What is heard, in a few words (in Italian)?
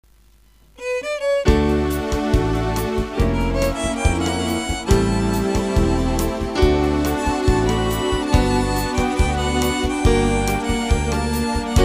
violino, tastiera